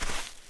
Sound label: sand; firefight